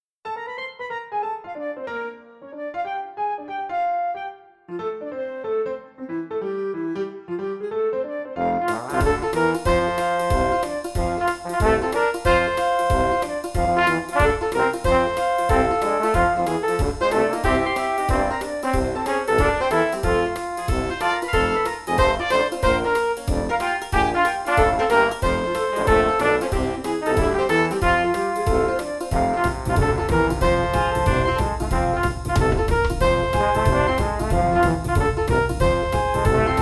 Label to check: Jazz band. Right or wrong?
Right